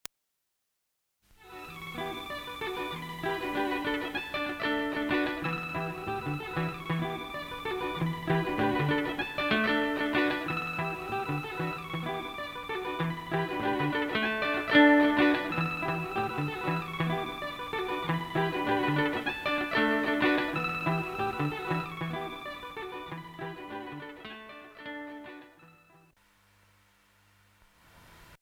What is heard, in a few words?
Little Guitar